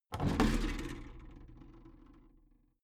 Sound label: big plastic case lid lift heavy